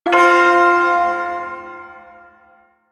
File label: Shawzin Chromatic Chord; Warframe; Duviri Ersatz Shawzin